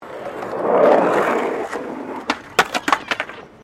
SKATEBOARD FLAT JUMP CRASH; Skateboard sounds; Skateboarding; Sports; Foundation4

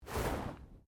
Body movement Slide; Body movement foley to simulate falls and deaths; Bodies; Human

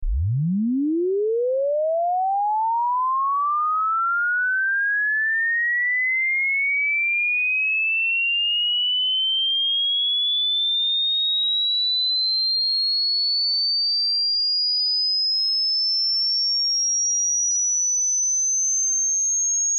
Test Tone Oscillating Sine Ascend 20Hz- 20Khz; 60 Secs -18Db; Technology; Tones; Blastwave

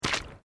Footsteps Boots Asphelt Puddles Step; Footstep Foley; Human

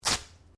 Clothing Velcro Rip Short; Movemement sounds for various articles of clothing; Ripping Sound Effects

